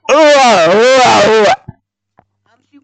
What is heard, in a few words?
aaaaaaahhhhhhhhhhhhaaaahhhhhhhhhhaaaaaaahhh